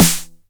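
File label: 909 SD Tape, snare drum; Goldbaby Tape808 & Tape909; The Tape 909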